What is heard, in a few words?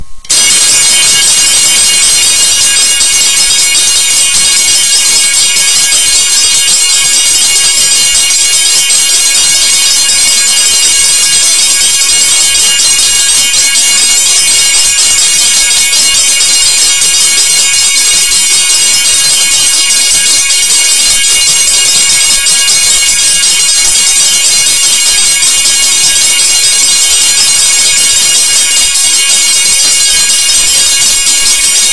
7 bells